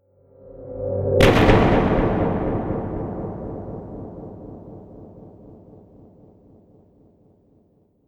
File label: sfx impact